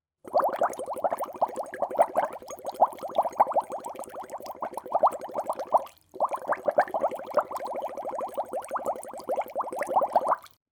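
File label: sound of bubbling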